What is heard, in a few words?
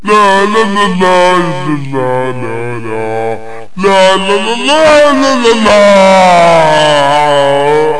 lalalala